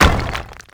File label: medium impact stone; WAR